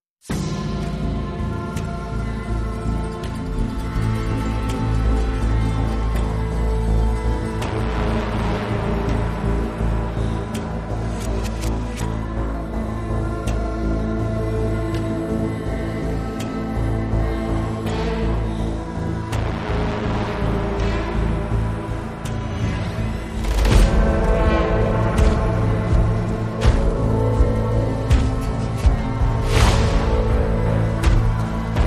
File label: Chilling Rituals, Less Guitars